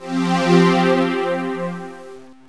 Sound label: transform